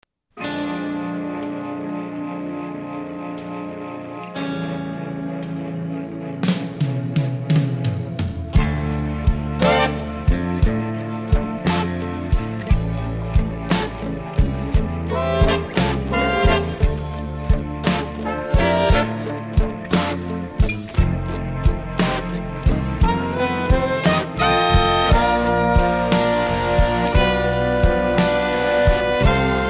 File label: harmonica son